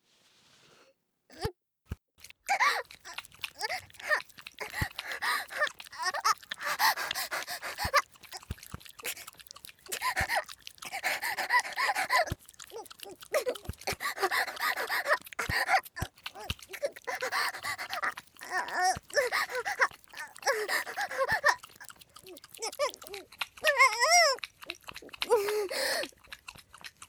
喘ぎ

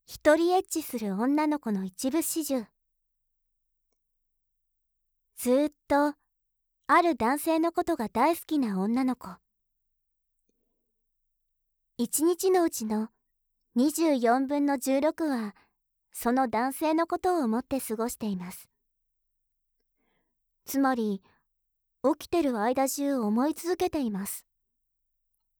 一人Ｈ

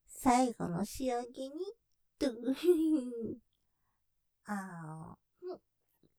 余韻責め, ASMR; お掃除フェラ開始; 立体; ステレオ; 最後の仕上げに♪はむっ！; ループで楽しむ！シェフの気まぐれ抜き抜きコース

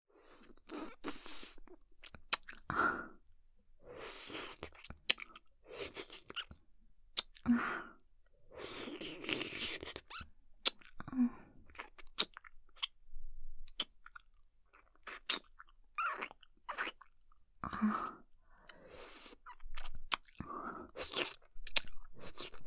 フェラ, ASMR

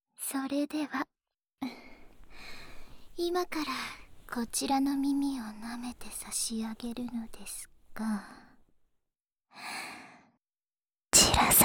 『焦らし耳なめ・乳首責め（左耳）』, ASMR

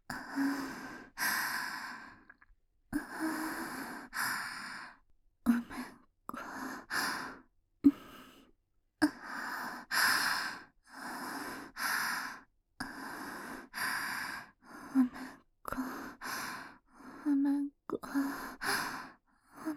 おま○こ 喘ぎ, ASMR

